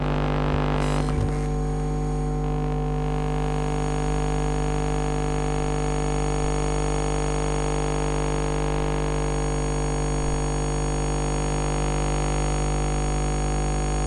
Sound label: static; Fnaf 3